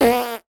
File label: human blow raspberry